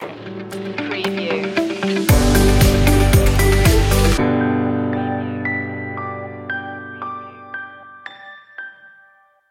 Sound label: storyteller stinger